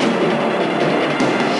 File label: Desert Percussion; G.I. Joe The Rise of Cobra; NDS